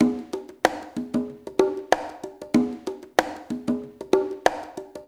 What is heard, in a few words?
CONGA, Liquid Grooves; Spectrasonics